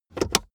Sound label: car accessories center console compartment close fast